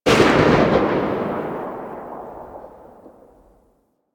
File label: AK47 Tail; Warframe; Tenno; Weapons